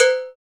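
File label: PER PROCU, Procussion, percussion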